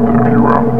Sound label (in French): monstre; DoodleJump